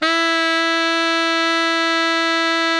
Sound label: baritone FF E 3, Sax; Giga Samples Collection